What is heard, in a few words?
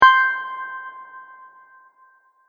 Tap Play, button; multimedia